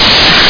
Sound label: bulkhead door